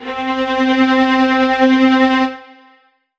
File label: Alesis Fusion Tremolo Strings C4